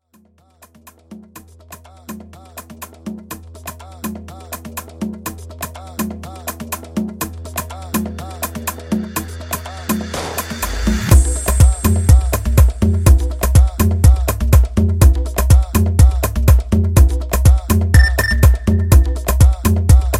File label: Afro House Intro; Remixfreakz